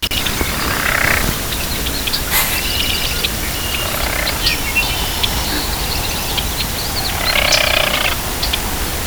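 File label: prr, King Rail; National Marsh Bird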